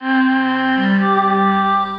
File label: Pad; Chromatic Voices; Choral Voices; Wyoming; Ableton; Factory Packs; Voice Box